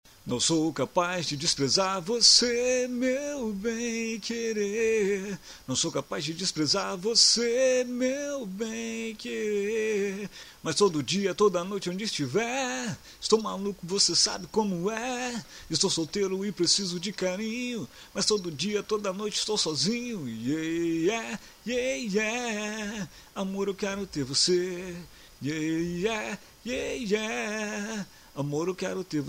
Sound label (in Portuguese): Funk